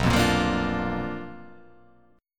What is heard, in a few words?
B Minor Major 7th Flat 5th, chord